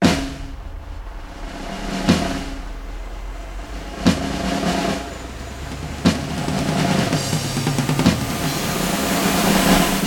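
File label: Music 10 secs left; Crayola Scoot; Splattag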